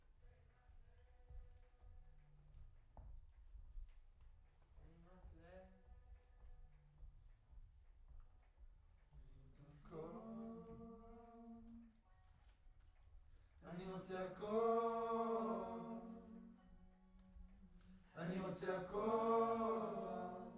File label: Choir Far